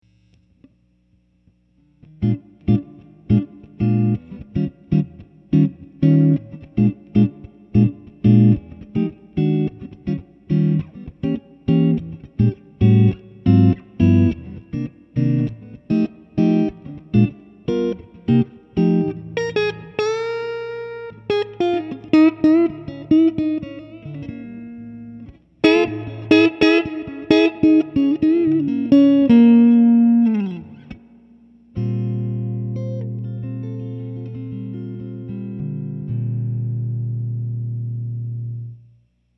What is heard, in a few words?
Tele standard warm jazz, guitars